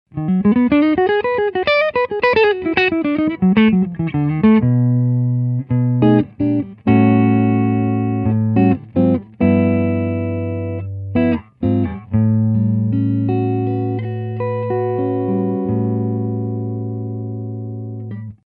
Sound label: Hollow T neck, pickups; Boppin